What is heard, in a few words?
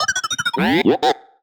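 Sci-Fi Droid Robot Emote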